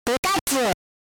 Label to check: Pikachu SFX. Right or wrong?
Right